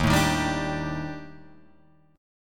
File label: Eb Major 7th Flat 5th, Guitar, Drop D; EbM7b5; strummed; JGuitar